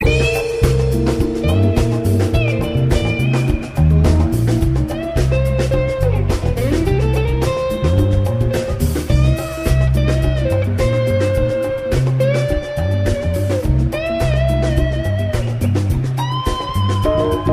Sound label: Back Bowl Jam